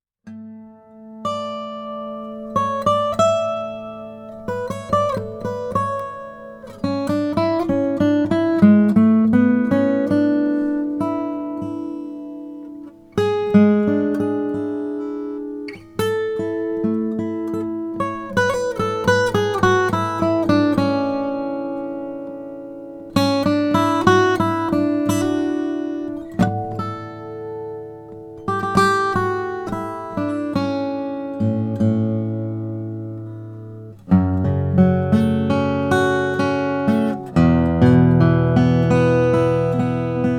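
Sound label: lowden ziricote; signatur; richard thompson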